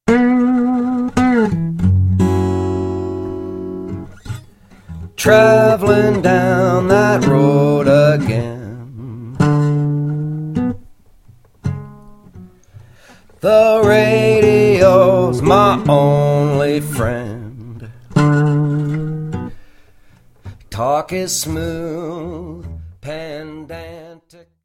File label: Long Hard Ride Blues